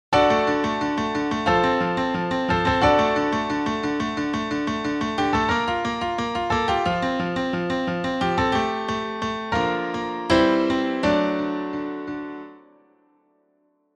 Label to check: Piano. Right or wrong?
Right